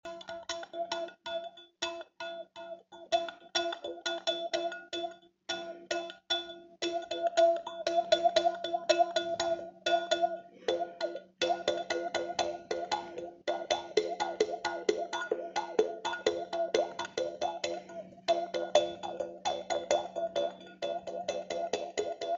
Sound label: jews harp